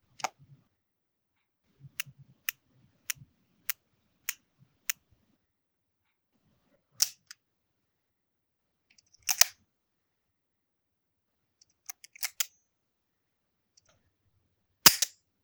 ruger open gate revolve close gate cock decock dry fire, gun sounds